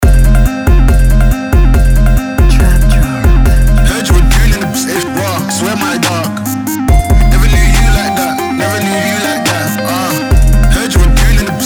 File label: trap drive; Diginoiz